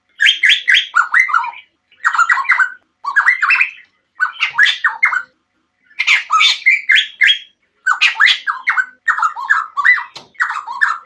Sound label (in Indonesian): suara masteran cucak rotan